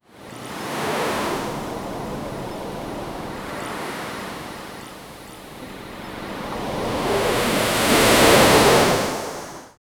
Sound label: ocean waves crashing, shore